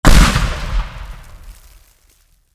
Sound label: explosion; teardown; ps5 windows xbox series xs